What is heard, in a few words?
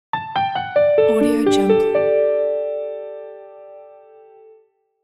acoustic piano logo